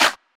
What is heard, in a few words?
hand clap f sharp key, Royality free clap tuned to the F# note. Loudest frequency: 2618Hz; percussion; single hits one shots